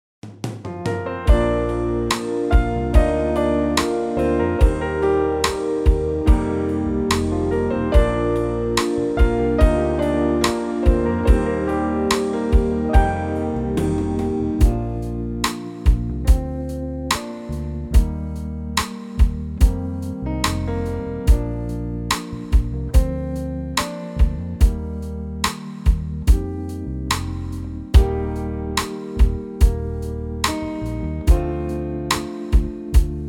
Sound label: Piano Solo Included Key Of Bb Major